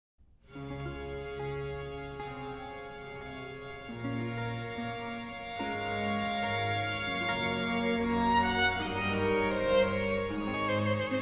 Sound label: orchestral suite no 3 d major air; bach